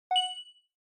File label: Onoma Inspiration High